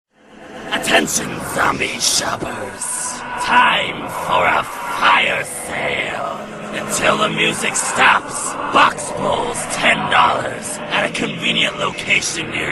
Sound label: powerup firesale; shadows